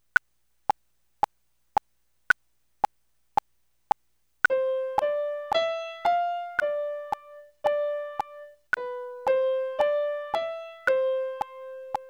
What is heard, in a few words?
Hound Dog Blues primo